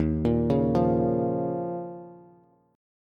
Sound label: Eb 7th Suspended 2nd Eb, Bass; Eb7sus2 arpeggiated; JGuitar